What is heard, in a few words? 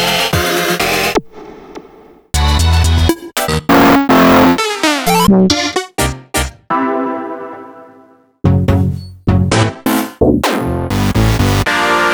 Synth Sounds